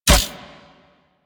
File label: Mech B; Warframe; Weapons; Infested; Inf Cernos; Prime Derelict Cernos